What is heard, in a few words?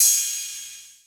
Hats Electro Open